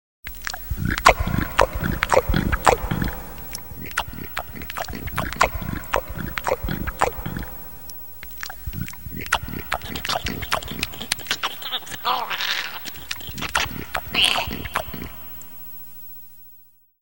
large animal eating; SOUND EFFECTS1

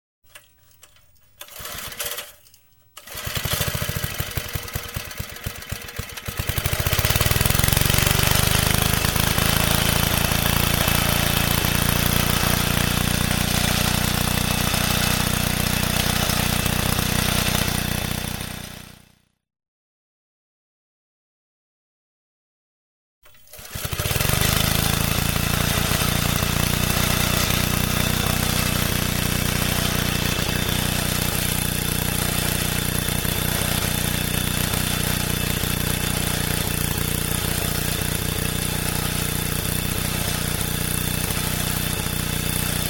lawn mower starting up; SOUND EFFECTS1

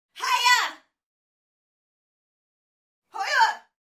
Vocals (Female Yell); Hollywood Edge Martial Arts and Human Impacts

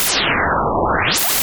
whoosh; Whooshes and sweeps

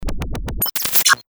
Cool Breaker, Breakers & Transitioners; Best Of N2O Library

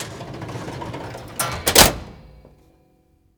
DRAWER CL, doors; 1000fx

